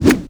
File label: golf hit; Tony Hawk's Project 8; XBOX; houses